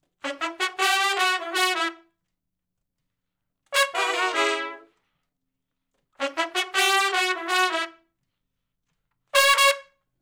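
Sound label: R&B Riff (C#min) unison to harmony, Horncraft; Sections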